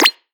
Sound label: Anime Jump Loud Short Sms Signal